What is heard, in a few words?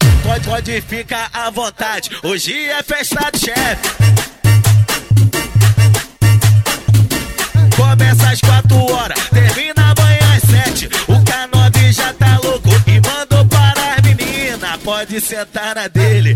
funk bass